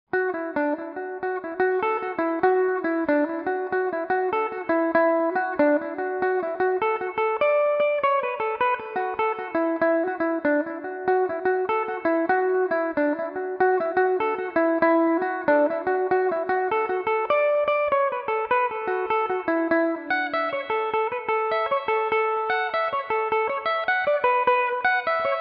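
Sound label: A major belmuse, irish mandolin; Belmuse EM-190 electric mandolin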